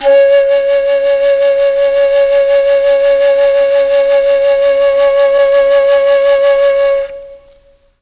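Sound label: C#3 chamberlin flute, Mellotron; Mike Pinder - Mellotron Archive CD; CHMBLN FLUTE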